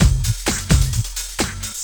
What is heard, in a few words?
drum 130 electro, drumloops